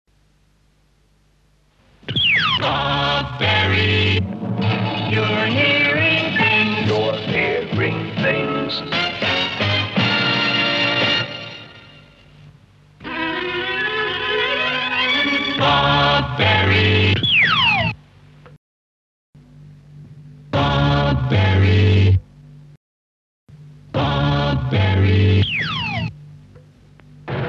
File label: Phoenix jingles; enhanced; "These were made by myself and I think they were just quick tests to see how they would sound. I can't find any finished ones."; Radio Phoenix jingles; Mersey Pirates